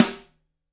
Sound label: Snare, Drumkits